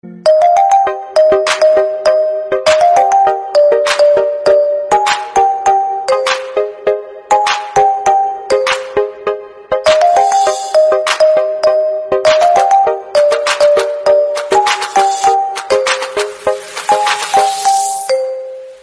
Bgm instrumental

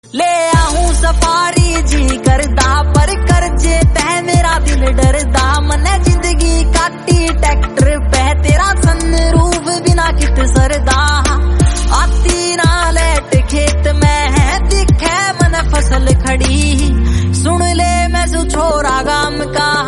Haryanvi Song

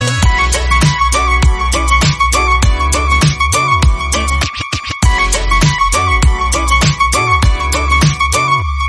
Instrumental BGM

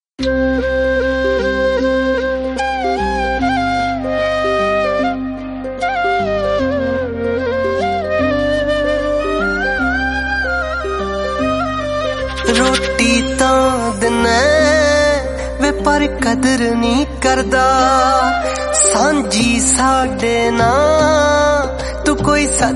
Instrumental Flute

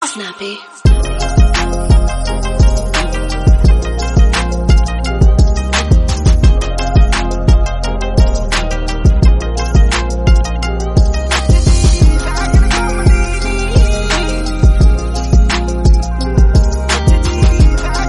Instrumental BGM

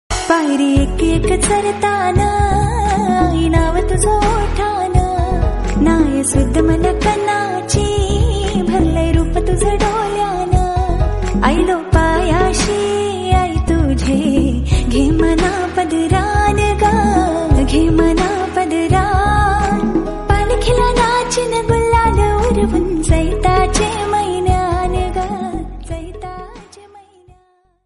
Female Version

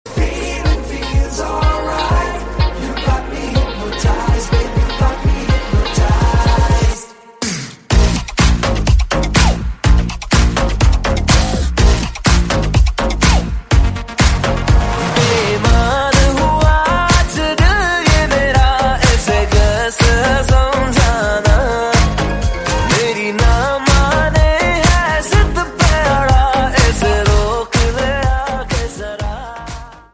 Instrumental BGM

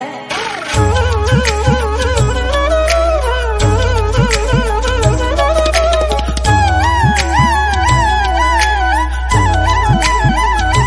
Instrumental Flute, ringtones

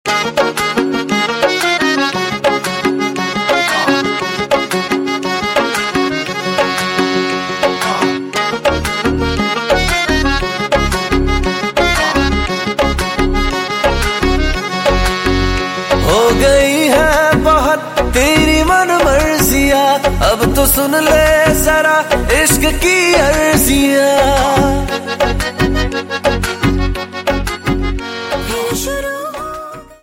BGM Instrumental

